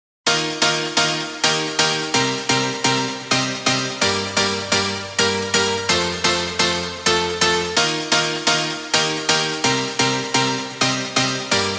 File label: Piano